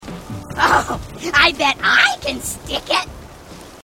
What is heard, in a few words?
grunt; h3dialogue; grunts